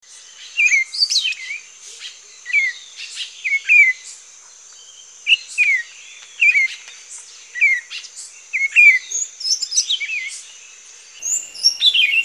Buff-throated Saltator

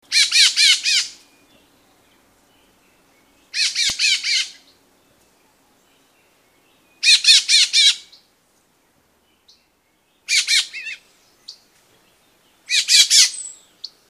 Silvery-throated Jay

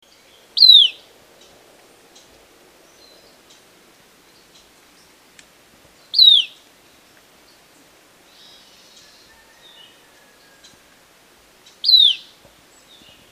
Mountain Elaenia

